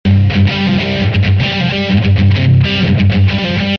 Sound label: G3 129 rhythm